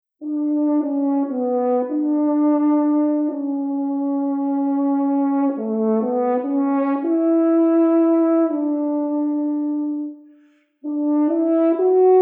trompa; Conhecendo Instrumentos Musicais

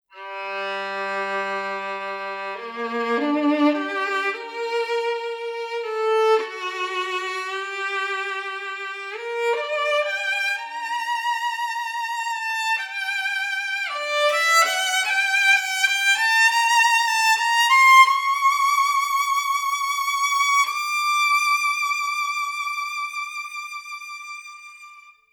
Violino; Conhecendo Instrumentos Musicais

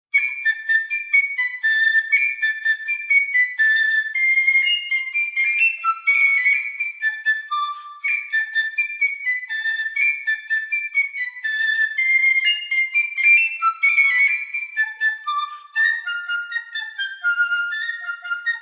flautim; Conhecendo Instrumentos Musicais

